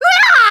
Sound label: Shock Burn; Link getting shocked (#2 also used for getting burned)